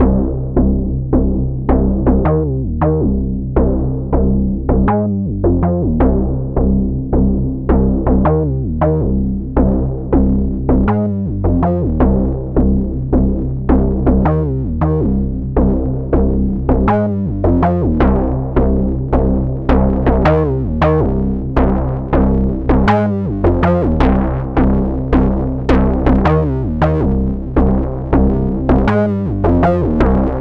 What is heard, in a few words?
fat matrix bass 80 bpm; arturia matrixbrute